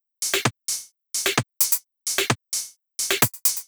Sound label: Beat 130BPM (Drums No Kick), techno